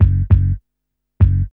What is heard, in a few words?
Burning Grunge Hip Hop, RNB 100; USB Soundscan vol.34; AKAI